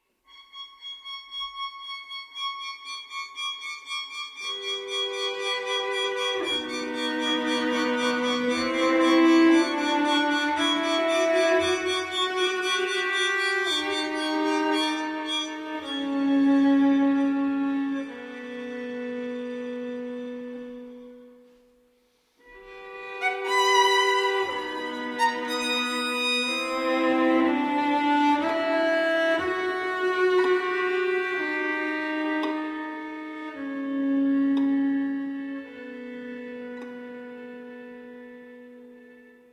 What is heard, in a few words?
String Quartet; No. 2, Images III. Várkvøld á Høgareyni